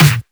Claps & Snares

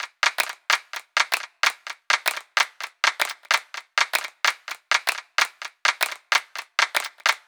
128BPM Big Floor Groove Kit; Vengeance Samples

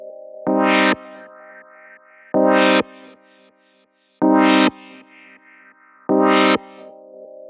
Melody 128 BPM Root C; Vengeance Samples; Melody Kits

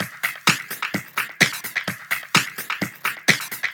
Minimal Loops

